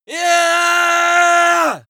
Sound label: male battle cry; schreie; menschen